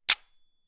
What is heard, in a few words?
gun 1911; weapon; still north media